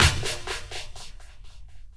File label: shuffle beat echo; Sonic Foundry (Sony Creative Software); Ugly Remnants VOL-2